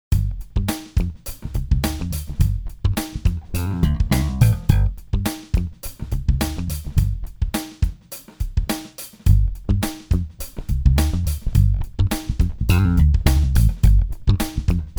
Passive Jazz Slap Spectra Comp Mid Vs Heavy; BG500

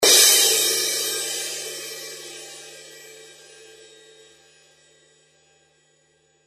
Solid Crash

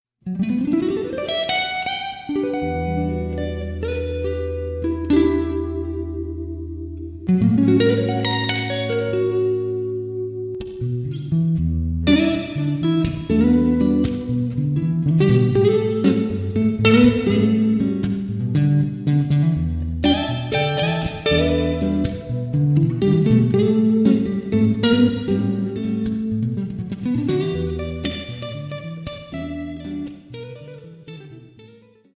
acoustic simulator; ax3000

